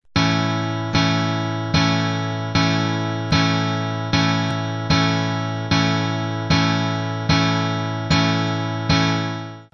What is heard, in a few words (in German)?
a dur akkord